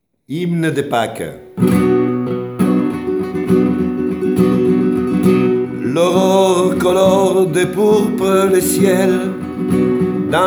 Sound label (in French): Hymne de Paque